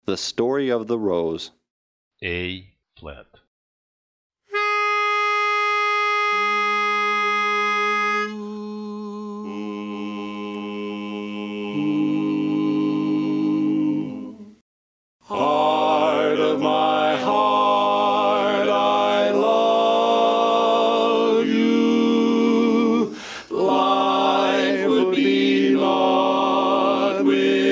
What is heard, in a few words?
Bari, barbershop quartet